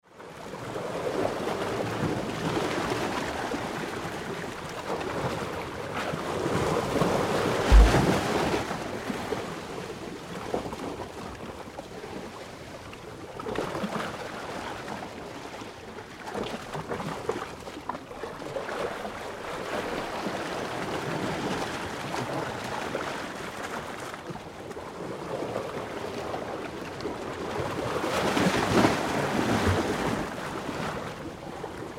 Ocean Waves in Rock Pools, Close sea wash into rock pool; Nature; Ocean Sound Effects